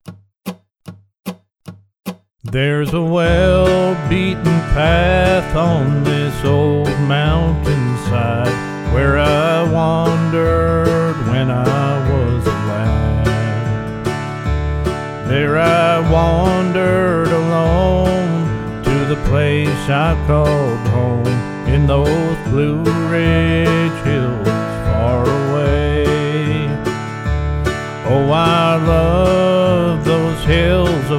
Key of D backing track with vox 75bpm